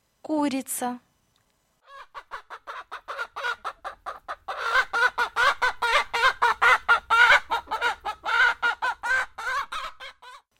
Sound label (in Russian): Курица